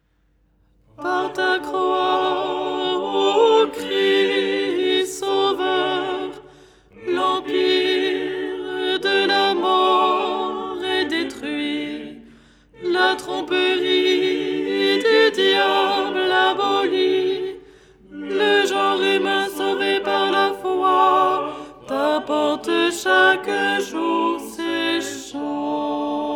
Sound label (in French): alto; ton 3